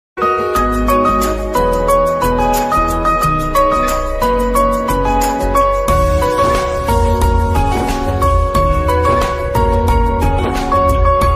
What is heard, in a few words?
Instrumental Ringtone